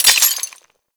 Dart Gun Mode Switch Ice; Quill

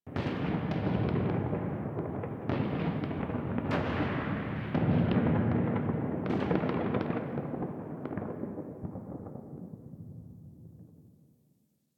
Ambient Distant Explosions; Warframe; Nineteen Ninety Nine

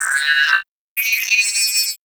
Computer Beeps; Warframe; Guild

